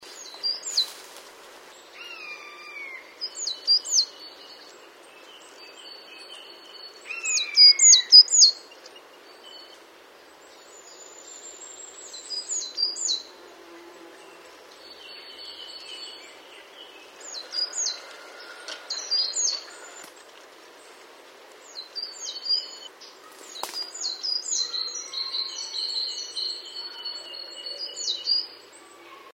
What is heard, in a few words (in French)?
Mesange noire